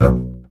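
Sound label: STR CBS, Solo Instruments, double bass; Miroslav Vitous; Solo Instruments VOL-2; Solo lnst. II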